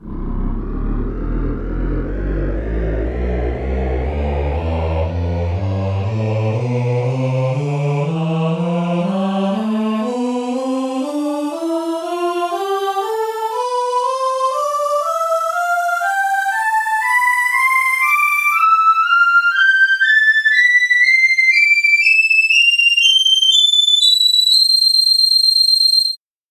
echo drops tonleiter

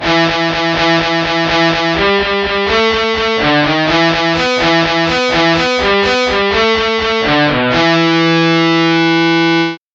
guitar harmonics bass

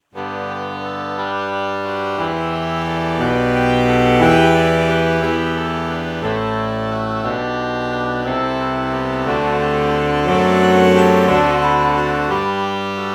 alto saxophone oboe morph

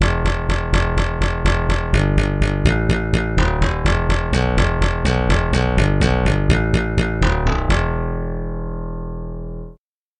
pop bass

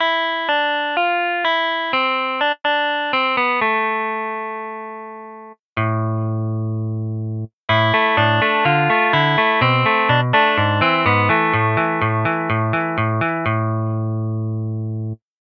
jazz guitar

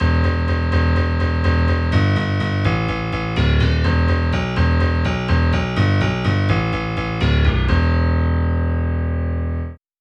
bright yamaha grand bass; SpectMorph Evaluation #4

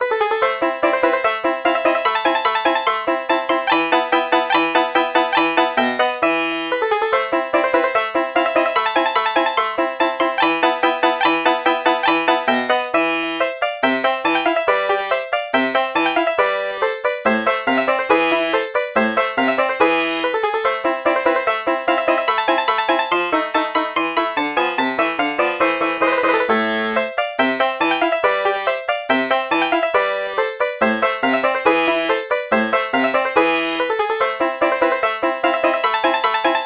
sitar

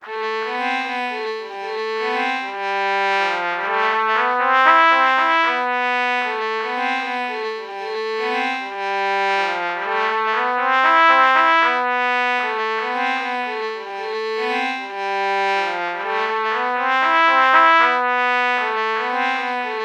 trumpet violin melody morph low

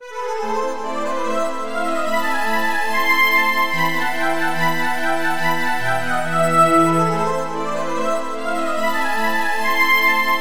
metal pad

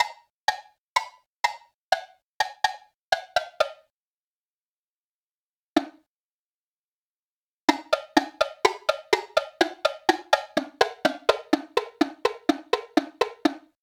woodblock

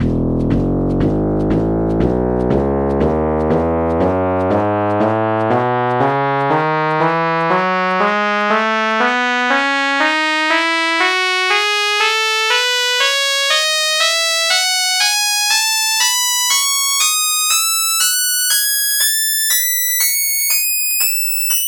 trumpet tonleiter

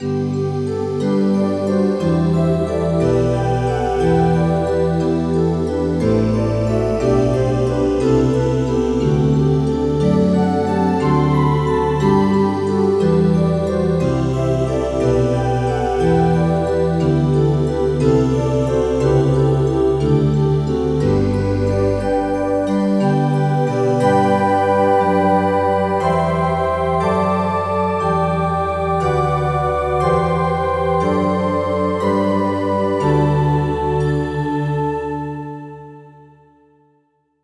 space voice

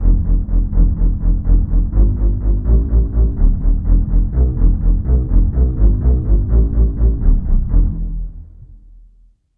pizzicato section; bass

